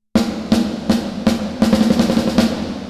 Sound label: Chorus High Detune Thicken Chorus